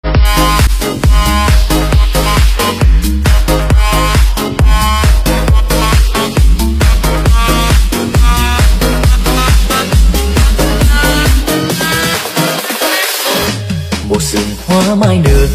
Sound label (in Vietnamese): Nhạc Xuân Remix EDM; Nhạc Xuân Mới Nhất